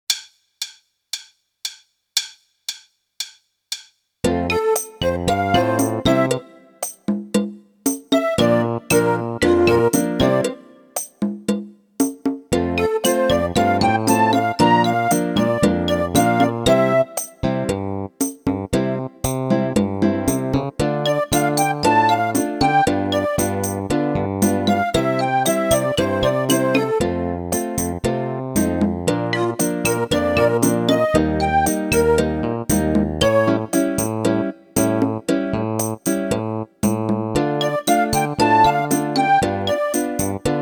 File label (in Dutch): Mijn Eerste Drum Solo